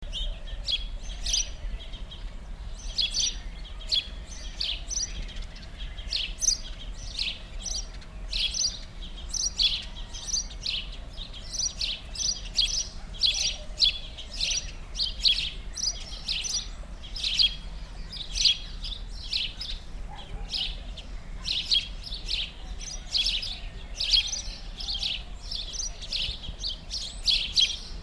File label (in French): Chant du Moineau domestique